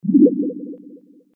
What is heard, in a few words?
sms; Clash of Clans